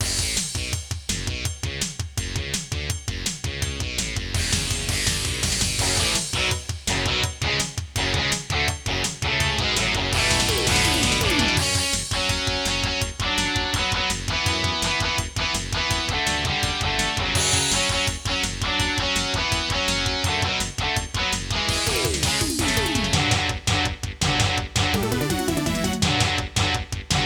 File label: AWE64 Legacy; Duke Nukem 3D Intro